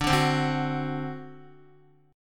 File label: D 7th, Guitar; 4F,4A,5C,5G,5C,6E; strummed; JGuitar